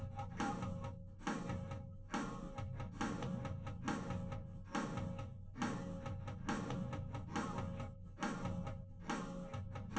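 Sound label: with drums live rec 250 ms 5 cm; SiSEC10; underdetermined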